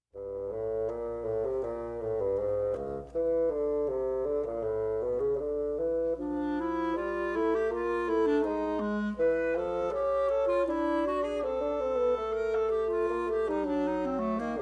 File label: Fugue Fantasia from Wind Quintet